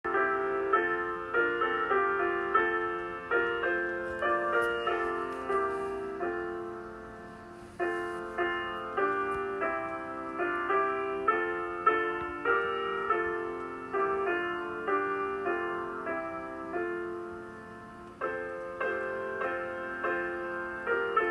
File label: My Country Tis of Thee Accompaniment